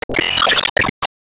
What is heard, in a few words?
Enemy Fashion 2nd Hp Damage Shot